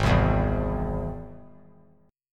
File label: Ab Augmented Ab; Ab+ strummed; JGuitar